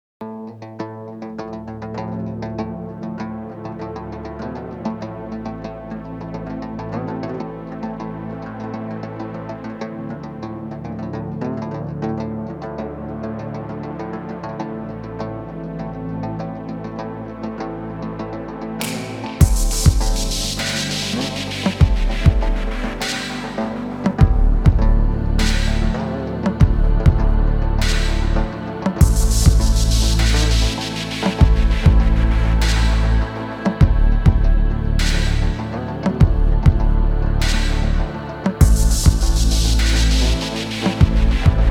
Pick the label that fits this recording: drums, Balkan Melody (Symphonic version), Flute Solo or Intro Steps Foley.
Balkan Melody (Symphonic version)